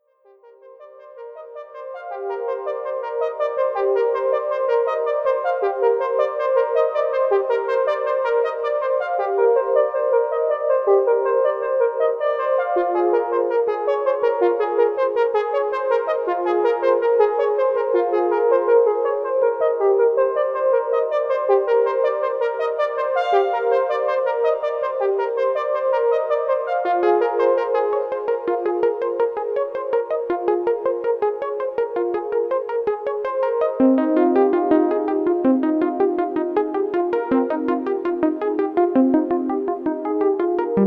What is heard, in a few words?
ppg wave